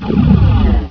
panther alert; custom monsters

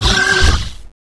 door; vendetta

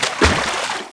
slosh, player; blackops

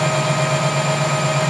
computer, ambience; vendetta

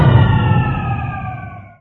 energy off; alienshooter

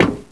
metal; blackops

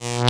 spark; vendetta

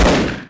pistol shot; alienshooter

